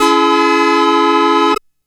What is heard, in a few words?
C Minor Analog one shot, Synths; Sonic Foundry (Sony Creative Software); Electronic Point Blank